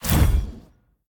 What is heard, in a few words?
swish; smite; tiamat